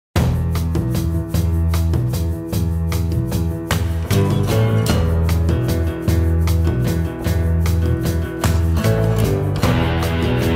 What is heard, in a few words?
Arena Ambient; Borderlands 2 (UST); Torgue